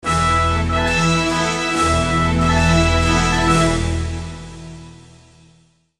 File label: Win Battle